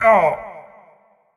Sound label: Marv Hurt